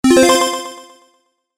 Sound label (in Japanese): 決定 中音; モノラル